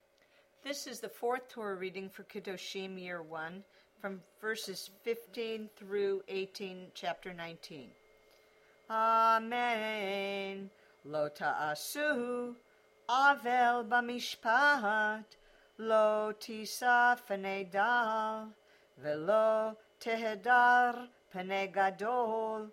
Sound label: Torah